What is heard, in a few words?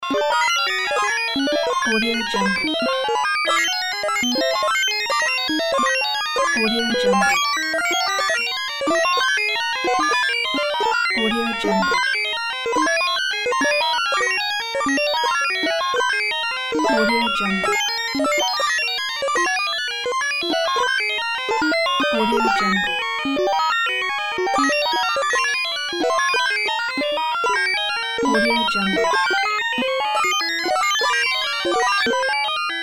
digital warp loop